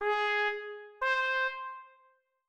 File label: int up Ab C